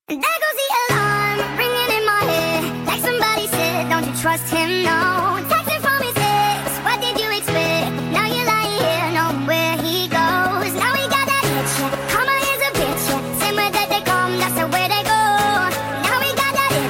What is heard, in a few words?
alarm in my head ringtone; english ringtones